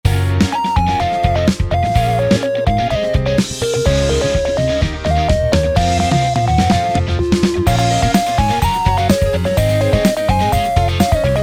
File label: Drums Cres